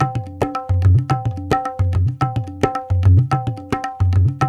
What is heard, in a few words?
Tablas